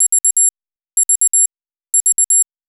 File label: alarms; Feverfew; HTC One M8